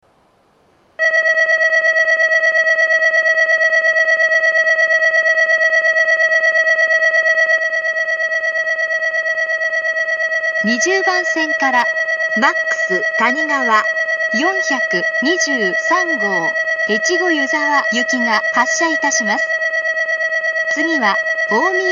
ueno 20 bannsenn, shinkansen; ２０番線発車ベル; やまびこ５７号盛岡行の放送です。

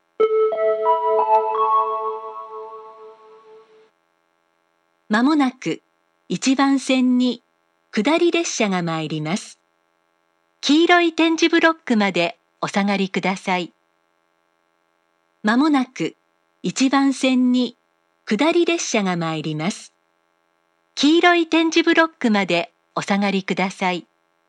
yumoto 1 bannsenn sekkinn

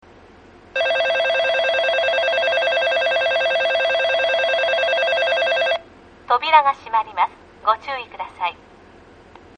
bell

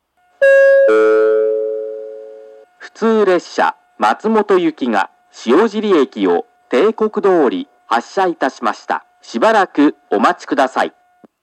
minami matsumoto 1 bannsenn shiojiri hassha

